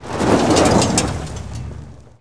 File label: Auto Tires Stop Gravel; Automobiles